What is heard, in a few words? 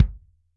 KICK Hi Damp open felt